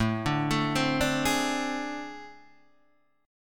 A Minor Major 7th Double Flat 5th A, Guitar, DADGAD